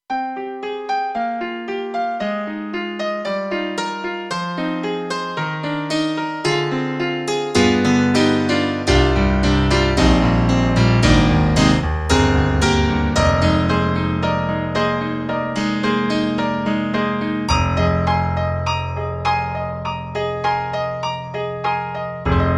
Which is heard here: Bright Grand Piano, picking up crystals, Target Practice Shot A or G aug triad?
Bright Grand Piano